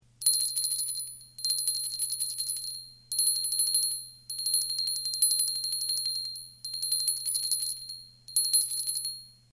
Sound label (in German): glocke klein